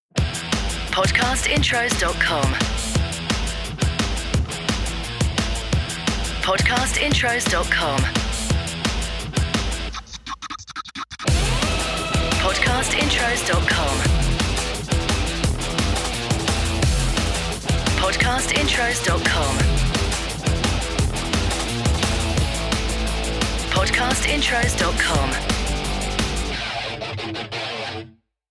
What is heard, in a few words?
DRUM N BASS; Podcast Watermark